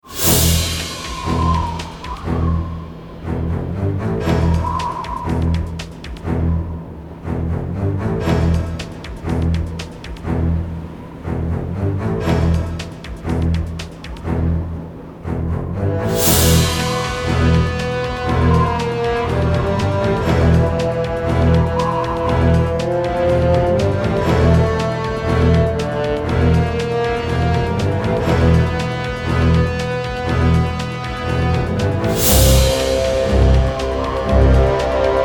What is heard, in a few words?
Peace Tense; Serious Sam 2; Kronor Military Base